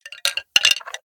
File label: Bones Jump Scare; The Inn; Devour 2021 gamerip pc macos